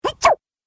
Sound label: Cartoon Gremlin Sneeze; Cartoon Voice Sound Effects